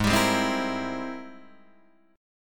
G sharp Augmented Major 9th G sharp Guitar Open D

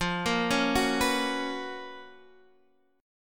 F sharp Major 11th F

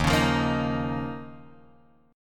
Eb 7th Suspended 2nd Eb, Guitar Drop D

